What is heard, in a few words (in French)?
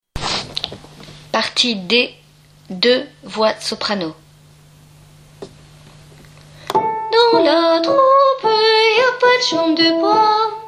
Dans la troupe sop partie D les 2 vois de sop